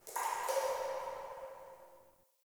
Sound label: Root Cave Magic Drip, Ambience; One Offs; Neathuns Root Cave